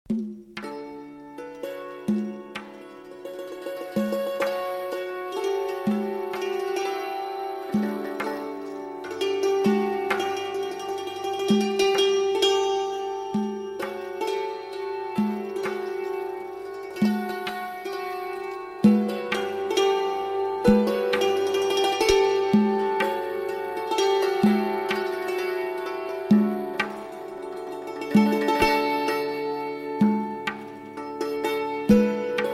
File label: CHANG, Chorgoh